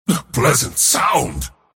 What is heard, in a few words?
last hit pain; Mars